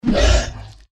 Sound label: deathclaw strike; npc